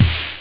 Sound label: low; OctaMED SoundStudio V1